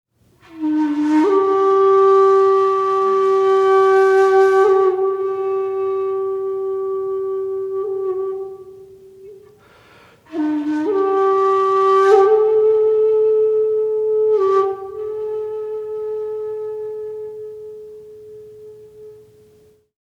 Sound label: Shakuhachi